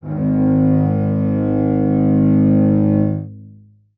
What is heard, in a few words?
string acoustic, cello